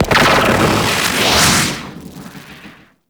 Queens Chamber Transference Switch; Warframe; The War Within

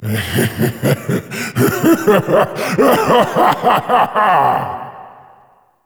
Vagabond Laugh; Warframe; Duviri; Easter Eggs

